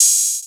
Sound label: dark tone open high hat sample g sharp key; Duration 0:01; Samplerate 44100 Hz